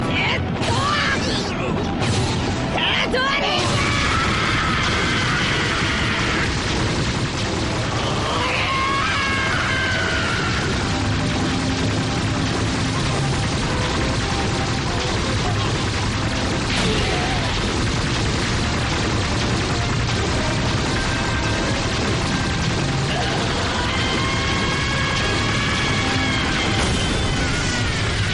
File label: Jet Gatling